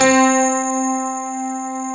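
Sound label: grand piano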